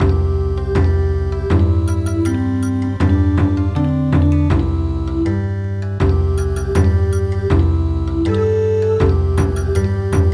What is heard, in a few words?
Bon Odori; Chick